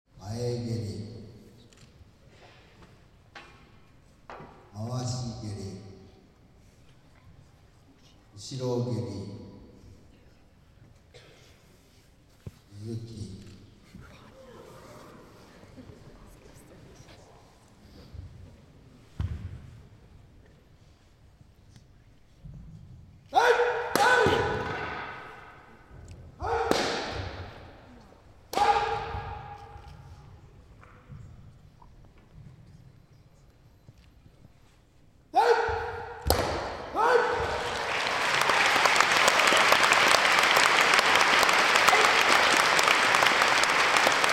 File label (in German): Holz surround